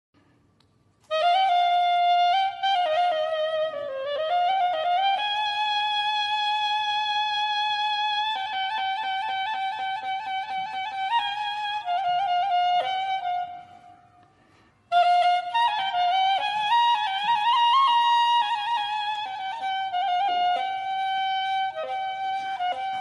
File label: music instrumental ney